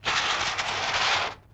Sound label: Sandpaper Skids, Vintage Cartoon; Foley